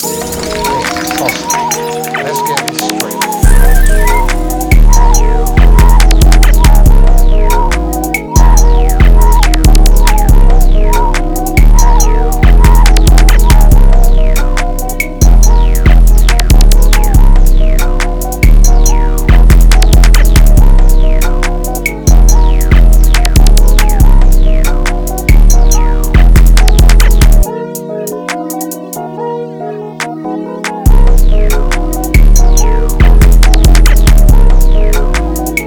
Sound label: 144bpm Cm new jazz